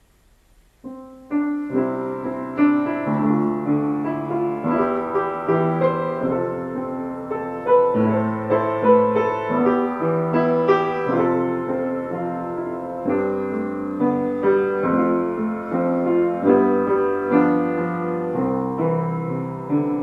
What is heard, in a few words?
instrumental